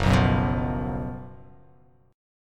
Gb Major 7th Suspended 4th Gb, guitar; GbM7sus4; strummed; JGuitar

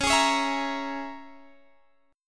C sharp Minor 6th C sharp, Guitar Standard; C#m6; strummed; JGuitar

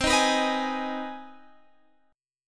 C Minor Major 7th Sharp 5th, Guitar, Standard; strummed; JGuitar

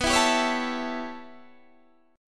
A 7th B, chord; A7/B; strummed

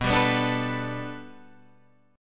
B Minor 7th Sharp 5th B, chord

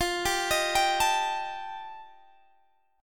G 7th Suspended 2nd Sharp 5th F chord

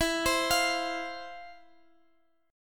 F 5th E, chord; F5/E arpeggiated

